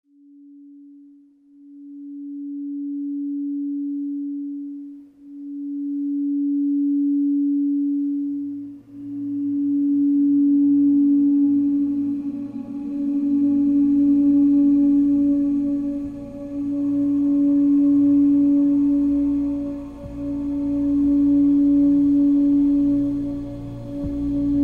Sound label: 285hz Brain Hemisphere Synchronization, binaural beats; Humans Regeneration